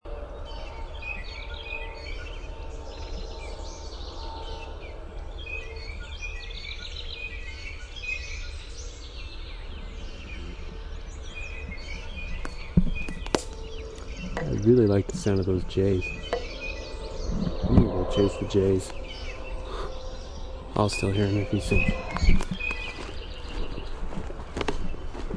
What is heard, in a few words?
BLJA pumphandle, nature sounds